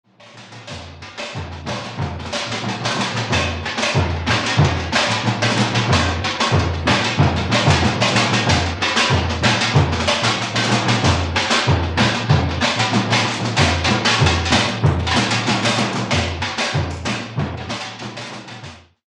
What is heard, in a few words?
batucada